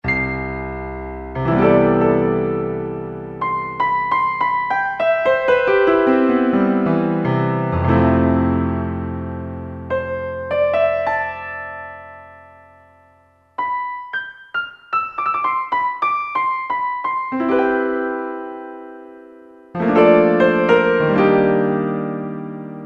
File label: Grand Piano; Roland SR-JV80-03 Piano